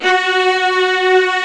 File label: Arco Violas F#2